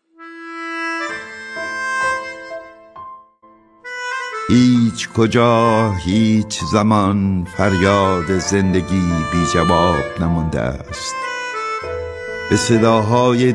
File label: Melodica